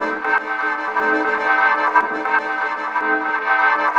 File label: Oncoming Accordian; Electronica; Tonal